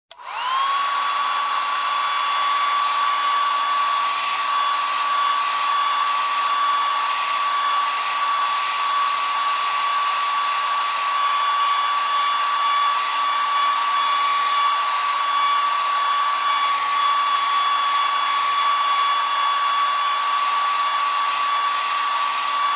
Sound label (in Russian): sushki volos fenom